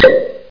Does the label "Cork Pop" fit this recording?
Yes